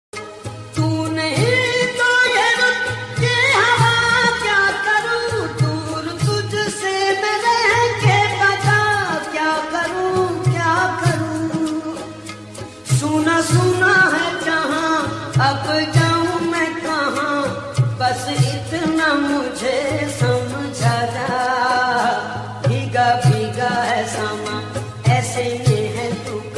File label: Old Ringtone